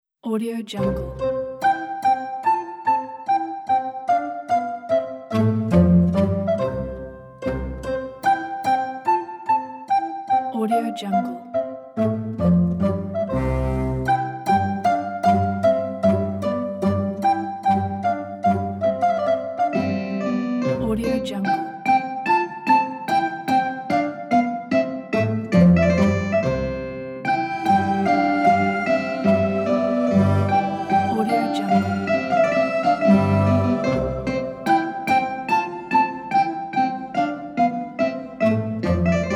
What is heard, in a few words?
the classical music